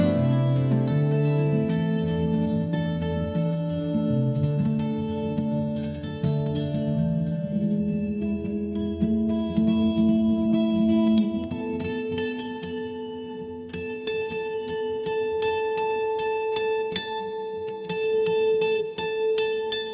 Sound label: ringtone romantic